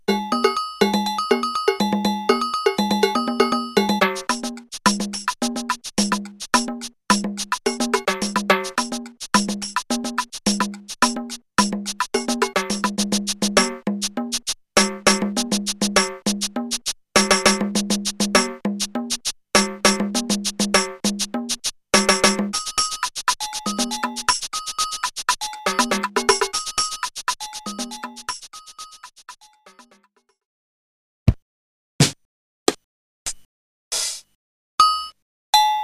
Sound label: Korg DDM220